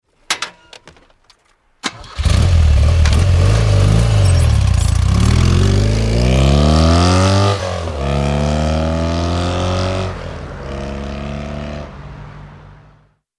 Motorbike BMW Start and away, BMW 1100 Side stand up; Motorcycle; Tom Hutchings